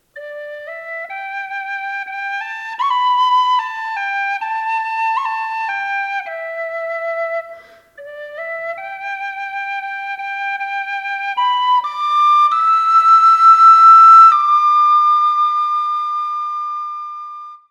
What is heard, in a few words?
whistle d